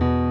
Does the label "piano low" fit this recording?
Yes